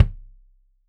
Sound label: Kik Stereo; Deeply Sampled Drums